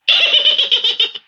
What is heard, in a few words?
vox laugh small